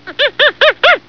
maymun; hayvanlar

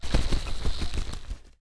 kanat, hayvanlar

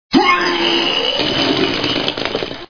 goril, hayvanlar

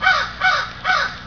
karga, hayvanlar

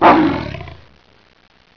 arslan kükreme, hayvanlar

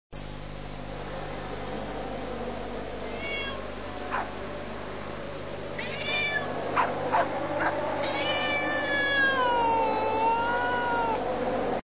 kediler, hayvanlar

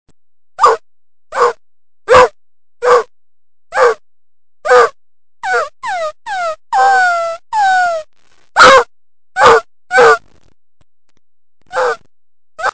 kopek sizlanma; hayvanlar

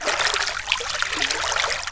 akan su; doga

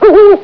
baykus; hayvanlar

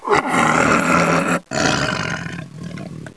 kaplan kükreme; hayvanlar

